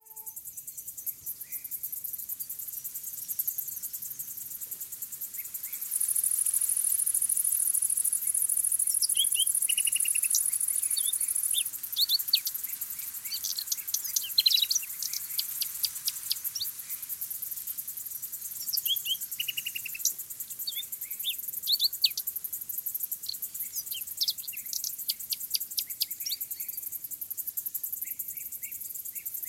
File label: Nature Grass; World of Castles